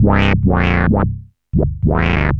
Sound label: ARP Odyssey, Arp A Line, synth samples